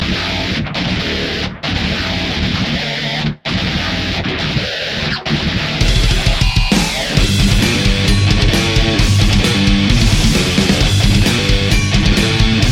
Heavy Metal

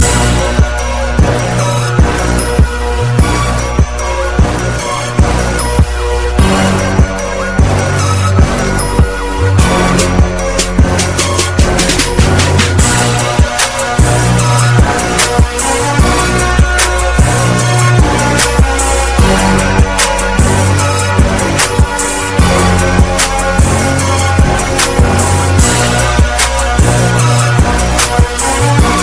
black beat, hip hop

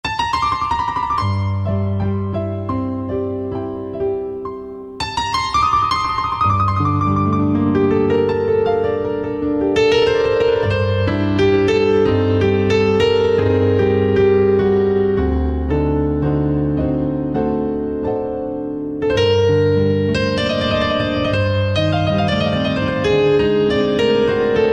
azerbayjan classical, ringtones

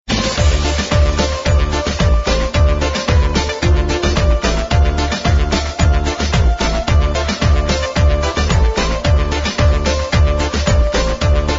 Loop Bass Mix

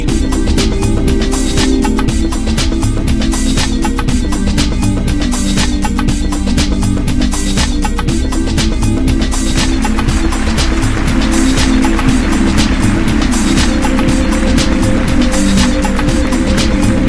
Accordian Music

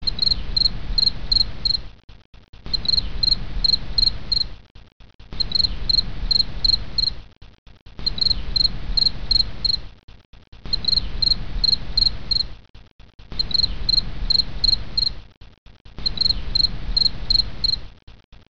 insect crickets; animals

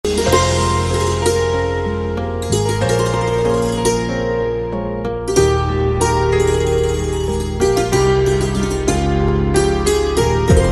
Santoor

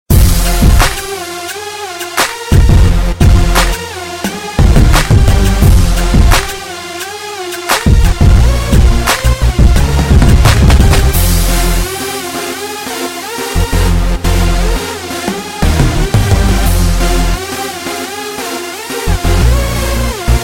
hip hop beat

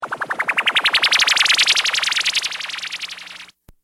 Woop Woop

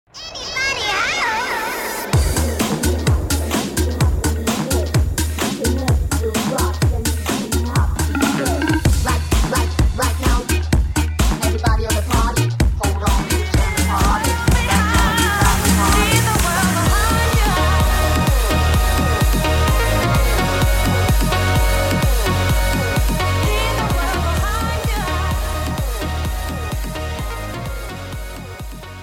house remix; hip hop

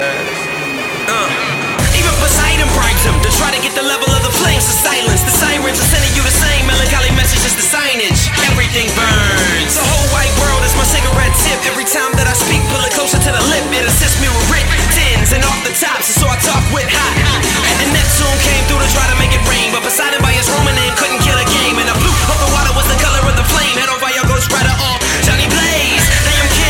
hard rap; hip hop

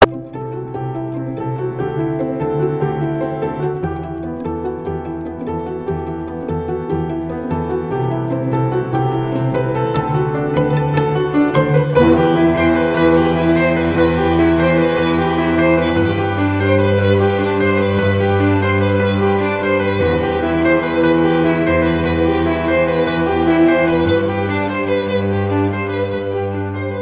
cool string tone; children

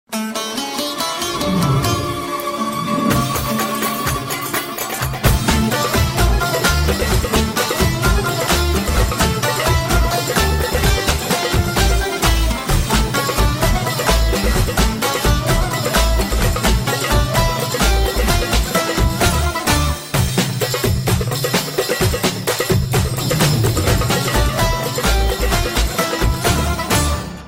arabian instrumental

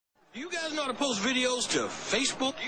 call to post

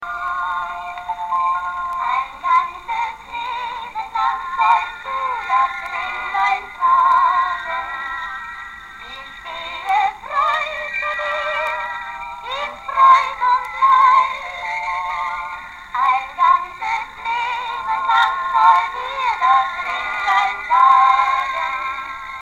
Nostalgy Chanson

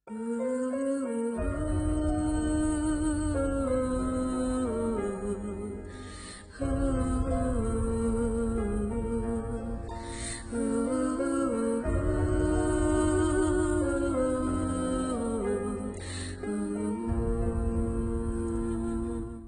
bgm

